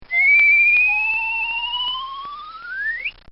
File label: Cartoon Sound Effects Rising; Cartoon Sound Design